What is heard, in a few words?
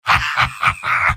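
laugh short, male; soldier; tf2; garrysmod; mvm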